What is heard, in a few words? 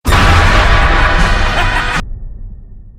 fear attack